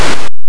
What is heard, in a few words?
boulder; gnome stones